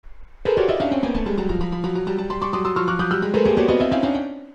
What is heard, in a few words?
Apple Logo Sound